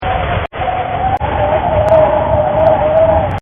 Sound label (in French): fond vent; Collection Synesthésie; Isabelle Grosse